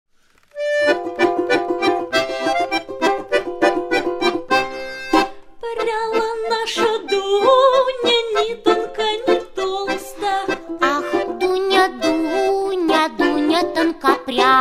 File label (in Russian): русская народная песня; dunja tonkoprjakha